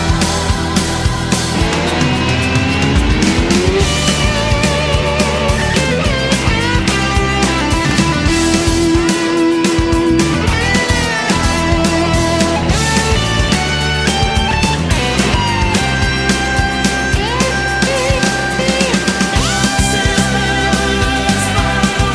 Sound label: instrumental; Canadian single version